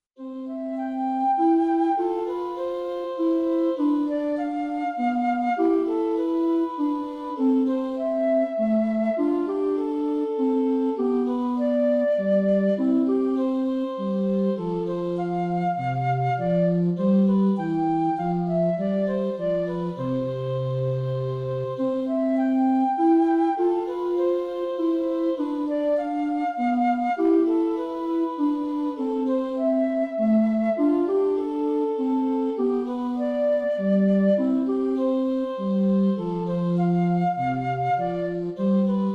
Minuetto; Scarlatti; Sonora vol B

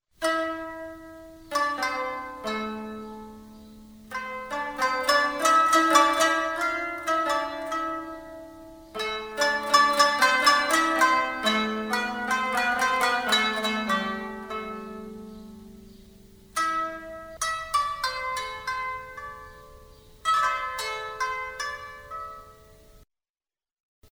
lira

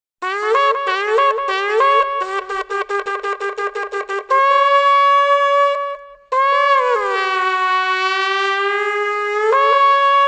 shofar

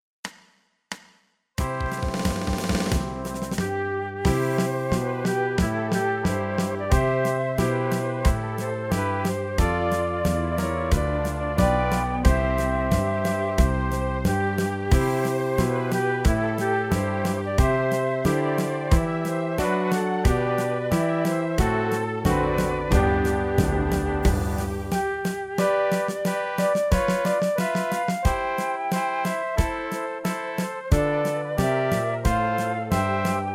Inno Albania; Sonora vol A

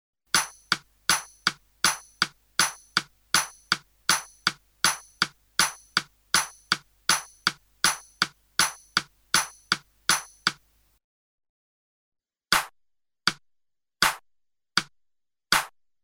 2 ritmi binari; esercizio; Sonora vol A

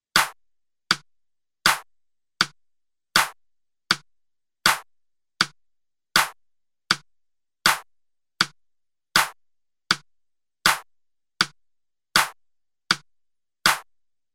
esercizio ritmo binario

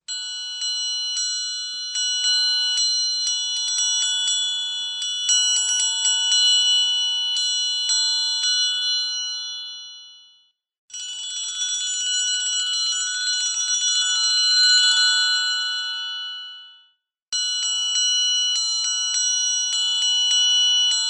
triangolo

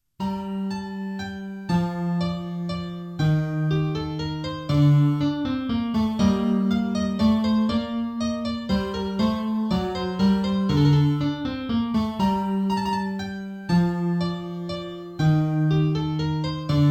fortepiano

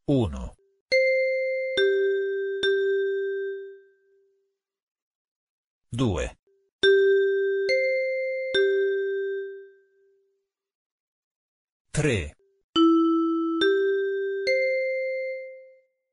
esercizio altezze; Sonora vol A

